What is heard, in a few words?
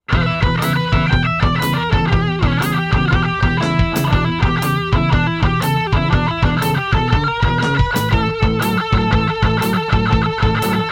Bluesy Jam